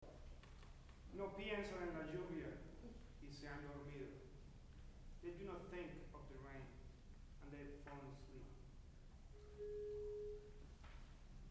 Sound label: rain